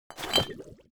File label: object bottle potion small pickup